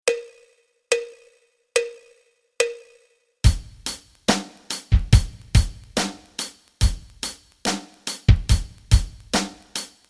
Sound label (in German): rock rhythmen